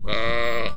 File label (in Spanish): oveja